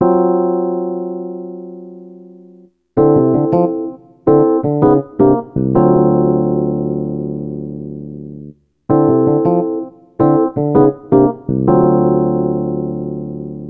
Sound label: EP Chords, Electro Lounge; Sonic Foundry (Sony Creative Software); 081 Laser Love